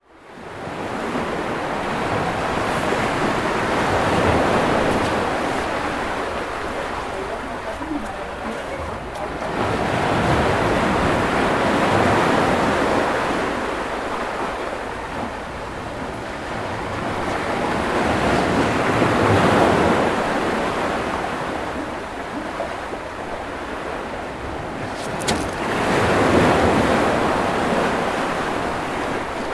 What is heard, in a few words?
breaking waves